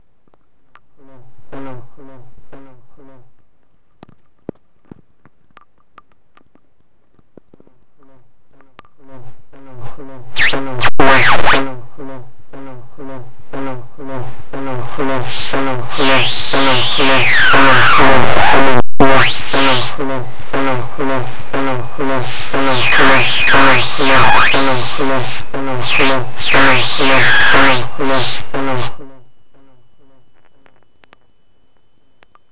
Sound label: in ear headphones connected to recorder placed near FM transmitter